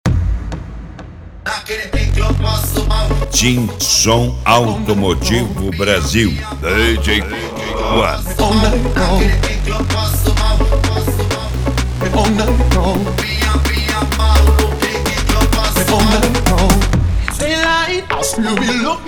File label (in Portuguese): som automotivo; team som automotivo